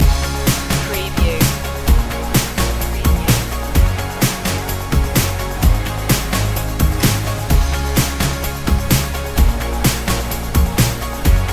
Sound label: hybrid throwback loop